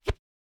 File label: Punch Swoosh; Hit Whooshes